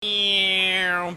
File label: giggle warning